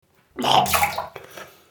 spitting out water funny

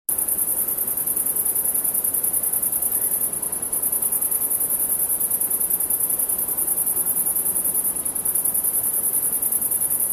insects at night

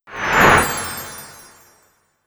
fairy swoosh; spellcaster

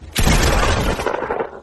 pump gun; fortnite

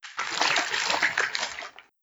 fish moving in water

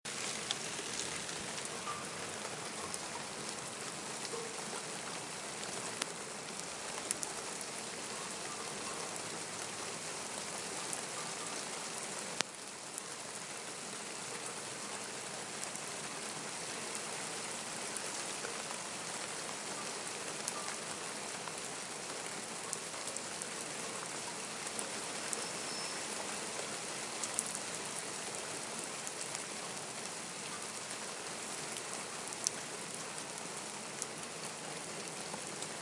mild rain

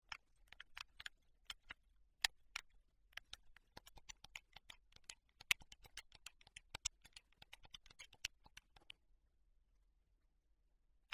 gaming controller

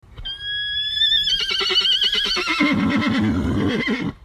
horse whinny

